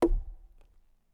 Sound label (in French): Baton buche Tom Median NUIT; Percu Bois